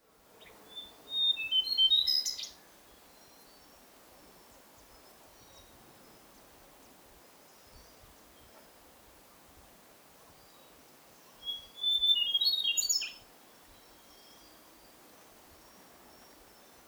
Lesser Shortwing Brachypteryx leucophris, lesser shortwing call